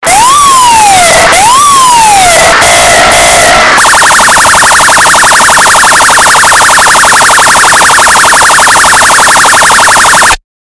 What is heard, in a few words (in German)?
sirene; altona